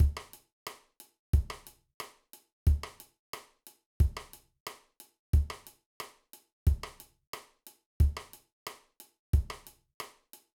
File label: Cross Stick Exercise, drum